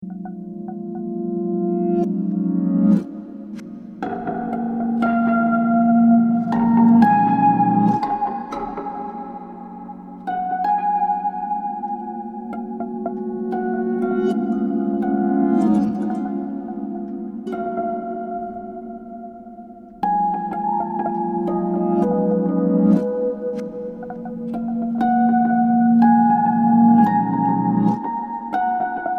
Harping; Sonic Collective; Outsider

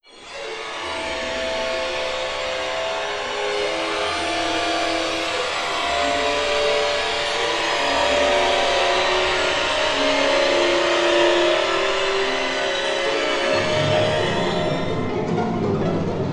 tension, orchestral fx and elements; big fish audio; kontakt

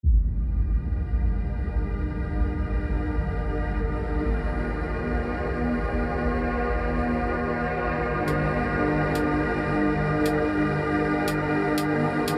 Post Rock Ambience And Organic Chill; Komorebi Audio

